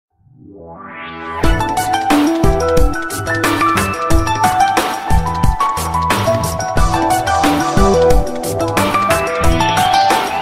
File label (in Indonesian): nada dering samsung